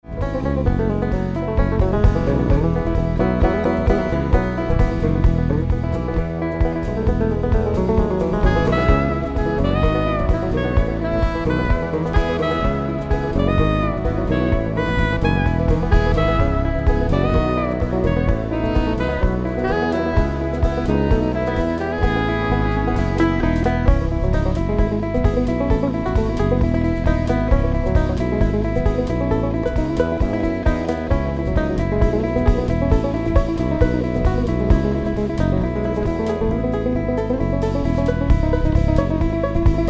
Outro

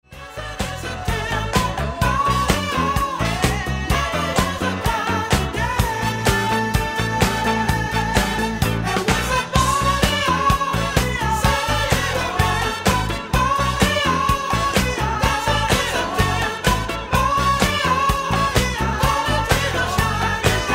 OUTRO

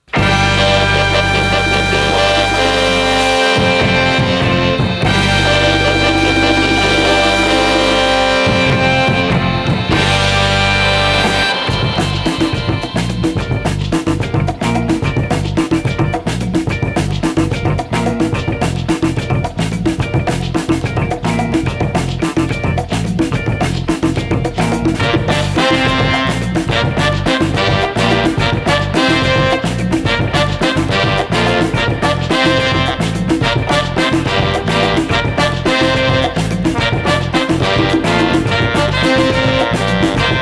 Brass Intro

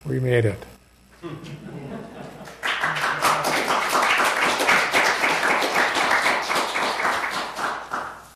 Applause; Evers; Vote 2020; WI

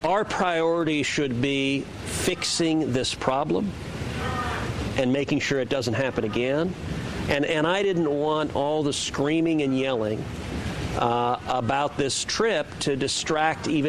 Screaming And Yelling Distraction

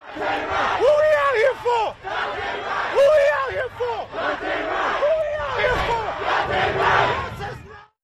Crowd; Out Here For Duante Wright; MN Police Shooting

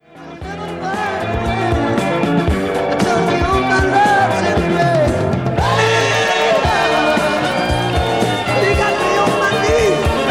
guitar outro

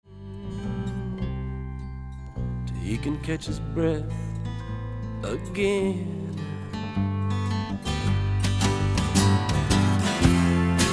OUTRO

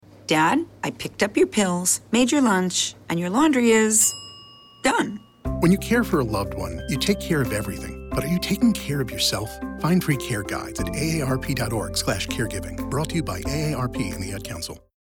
arp

